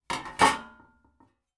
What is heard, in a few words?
pot clank put down metal lid on pot handle